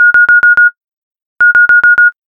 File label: High Intensity; interval-timer-ios